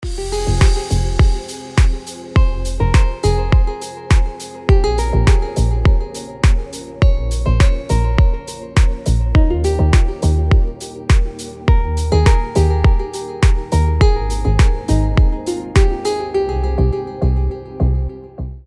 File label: floating clouds